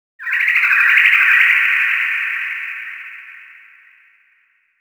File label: environment creature chirp